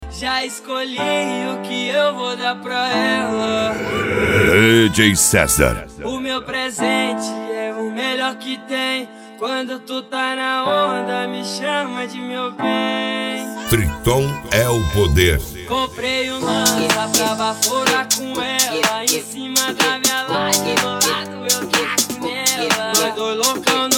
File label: mega funk